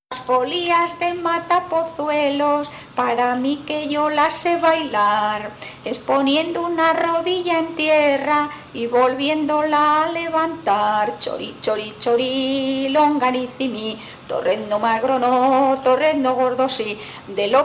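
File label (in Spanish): folias, Bailes tradicionales en Valladolid; Matapozuelos; Las folías de Matapozuelos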